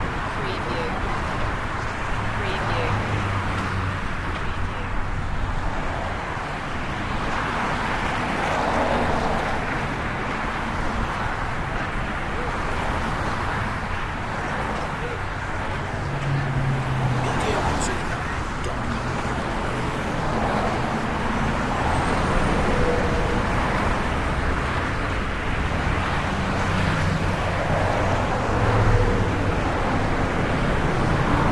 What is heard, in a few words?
Freeway Traffic Fast Long